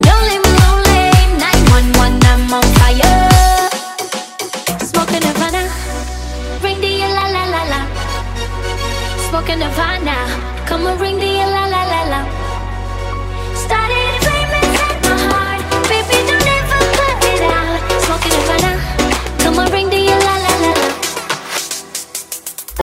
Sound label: baby turkish ringtone